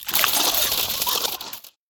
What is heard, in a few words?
Scarab Keychain Attack